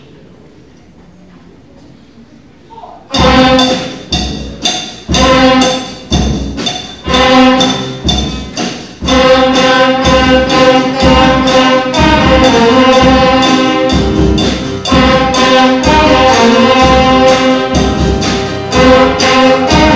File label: Overdrive